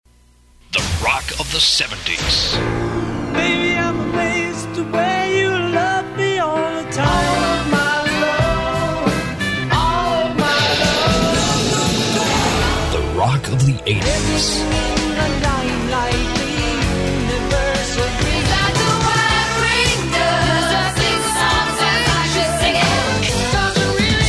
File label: Rock of 70s 80s 90s